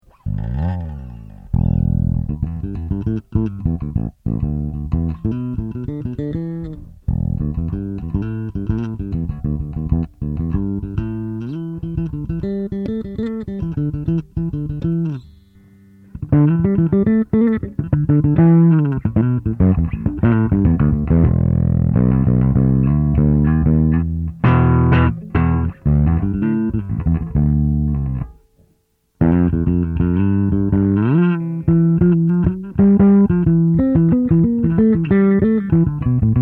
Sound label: JB FLIP TOP; electronics